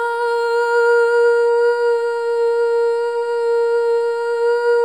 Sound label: solo boy; Spectrasonics; Symphony of Voices